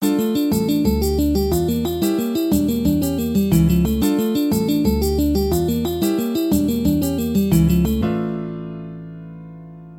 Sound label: alternate picking exercise